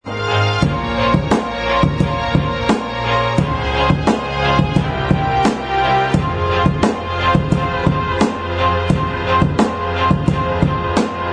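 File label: instrumental, backing tracks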